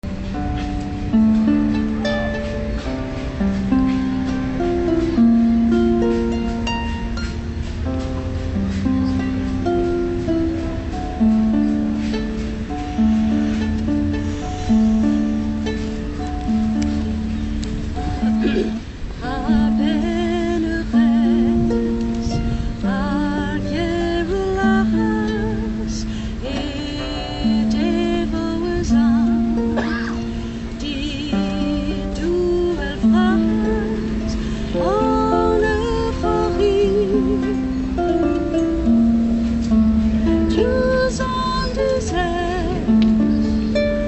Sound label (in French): harpe; avril mai